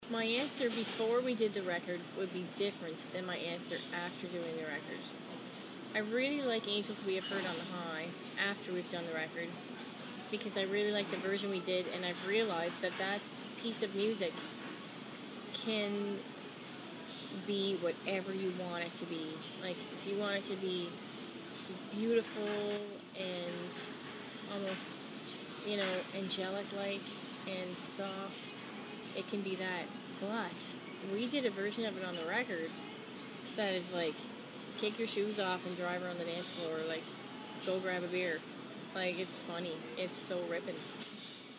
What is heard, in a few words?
Christmas Song